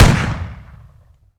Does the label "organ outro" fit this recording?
No